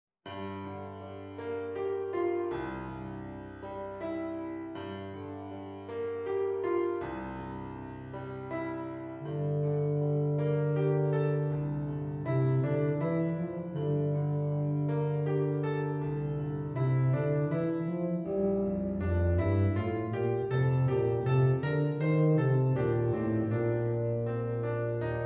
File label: TUBA; eb bass or bb bass